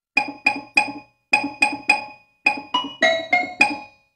Jingle Bells; Peanuts

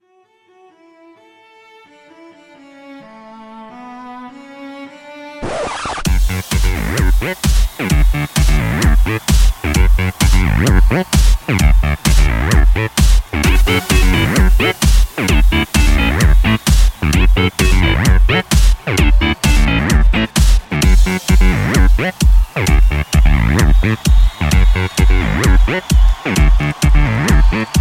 Techno Alarm

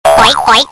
oink boink; animals

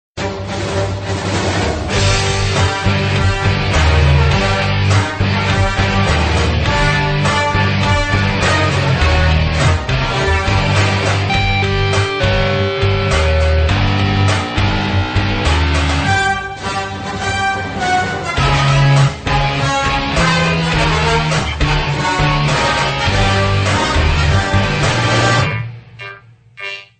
star wars metal

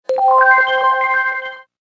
bing

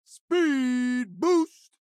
Speed boost; Lucio

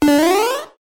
Jumping; SMB3

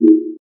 alert

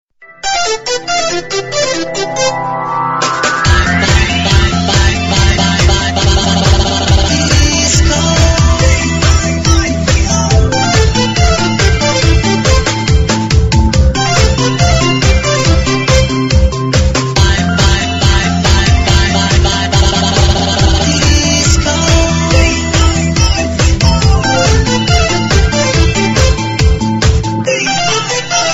Nokia Disco charge

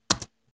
Chiclet Keyboard

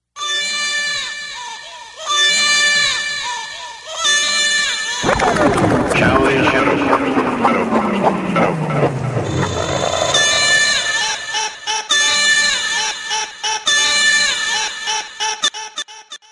digital baby cry mix

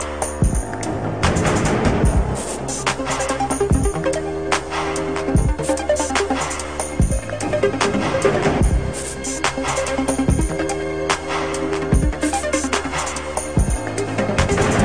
loading; gta 4

